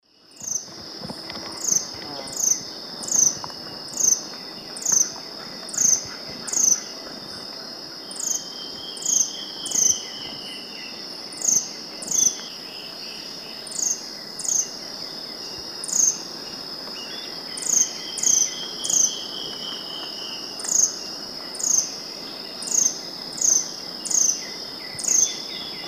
Amazilia fimbriata, song; S. America; Eastern Lowlands

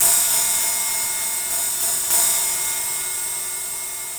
Dry Ice, Electronica Noise Circuits

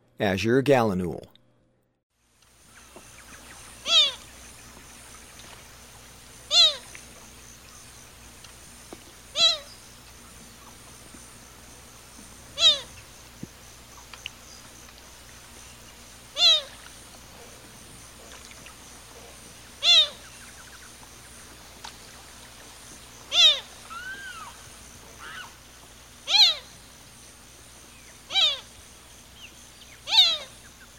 Azure Gallinule; S. America; Eastern Lowlands

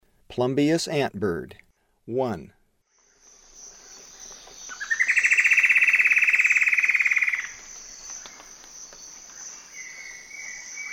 Plumbeous Antbird; S. America; Eastern Lowlands

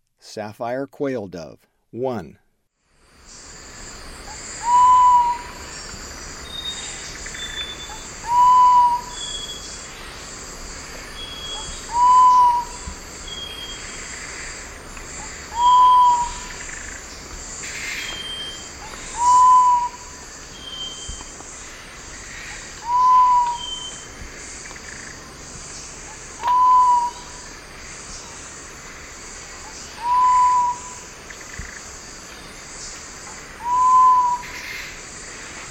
Sapphire Quail-Dove; S. America; Eastern Lowlands

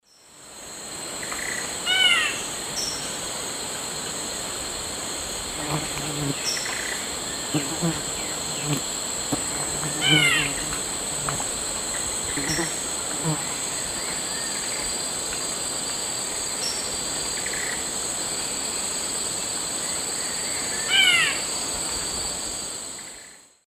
Saltator grossus, call; S. America; Eastern Lowlands

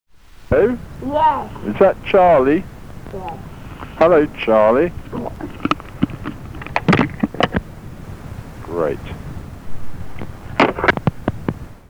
Phone Call

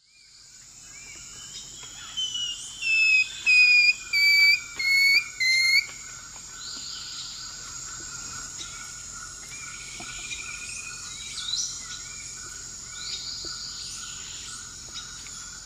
Deconychura longicauda, song; S. America; Eastern Lowlands

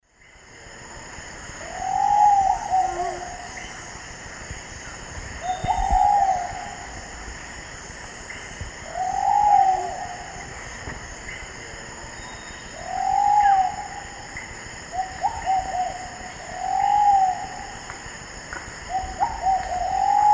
Patagioenas subvinacea, call and song 2 birds; S. America; Eastern Lowlands

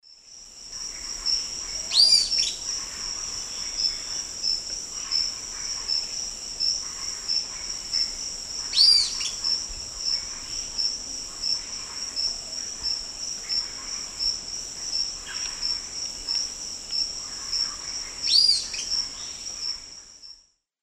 Tolmomyias traylori, call; S. America; Eastern Lowlands

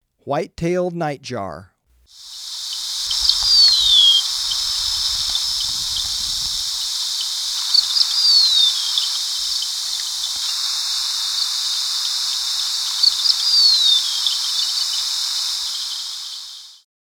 White-Tailed Nightjar; S. America

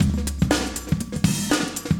chique 120, loops, 120bpm; Sound Effect Library

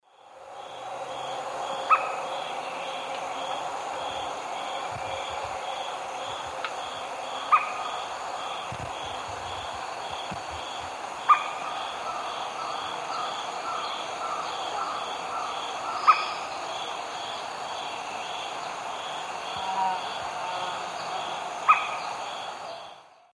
Xipholena punicea, call; S. America; Eastern Lowlands

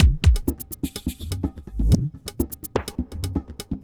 ethnic groove 125

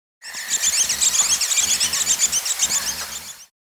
Violet-Fronted Brilliant (Heliodoxa, S. America

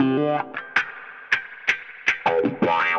Funky Wah Guitar; Guitars

